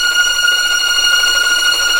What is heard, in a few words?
STR TREM, Vlns Tremolo